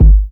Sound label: sharp rap kick one shot g sharp key, steel kick drum sample